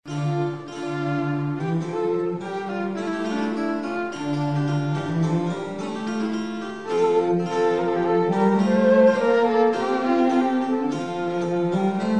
gagliarde trio

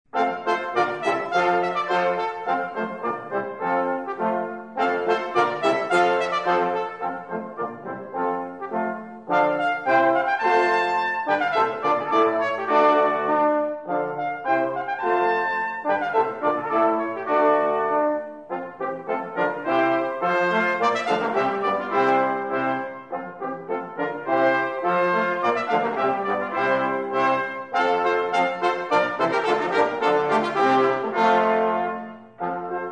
german dance brass

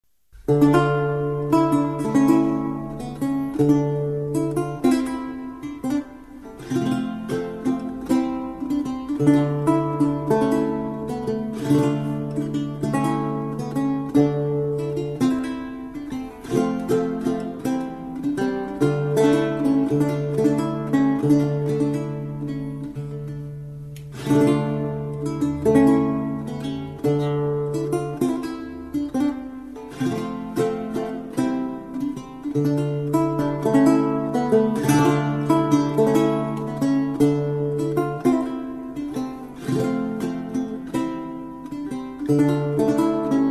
folias guitar; Folias d'Espagne; sanz

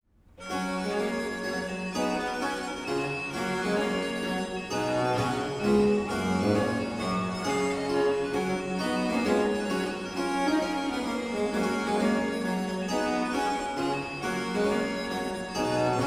musette passepied, bagpipes; folk music; Boismortier

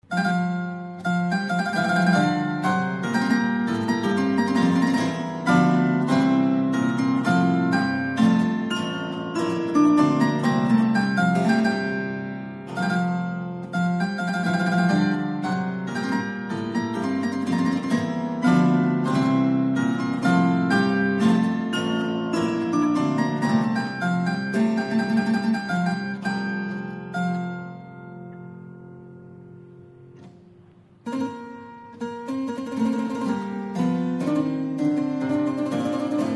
sonata harpsichord; Sonata in b-minor; scarlatti

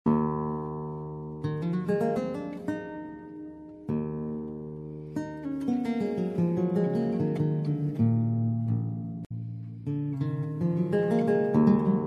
prelude e theorbo; visee

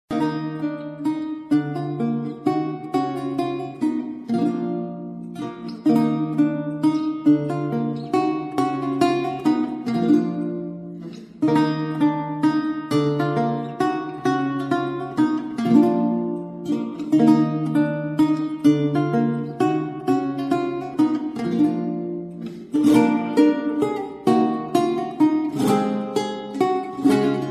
menuet guitar; de visee

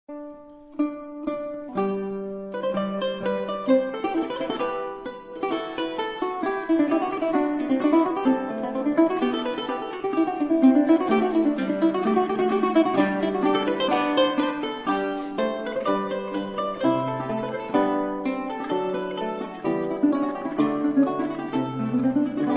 terzi canzona

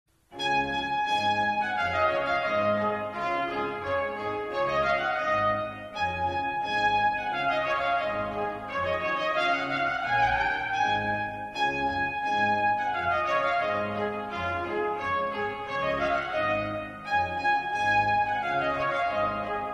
trumpet tune; clarke

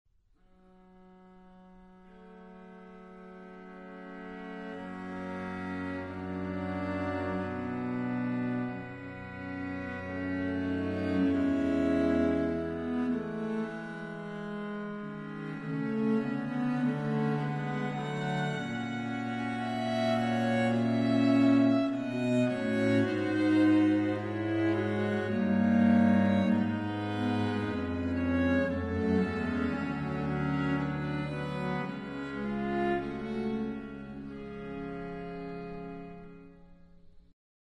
suite G minor viol; locke

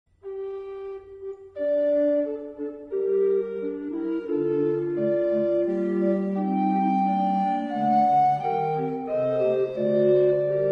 fantasy recorder; ward

